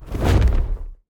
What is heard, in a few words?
wing big; smite; god cthulhu